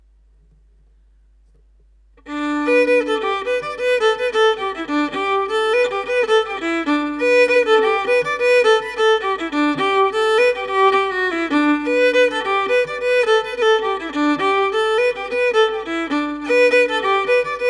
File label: solo fiddle; Out on ocean set